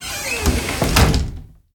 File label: Closet Close